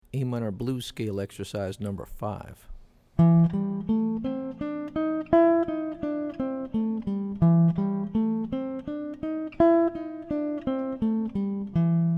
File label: A Minor Blues Scale; lead skills materials